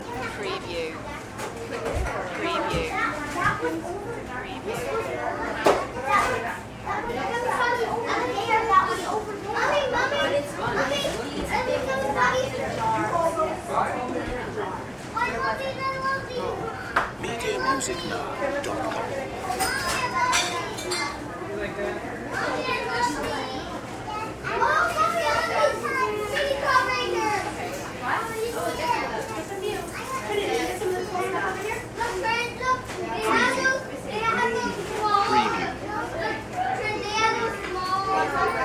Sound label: Busy Candy Store; Crowd Sounds / Crowd Ambience; Families inside candy store.